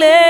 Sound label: Vocal Oneshots; Vengeance Samples; Vocals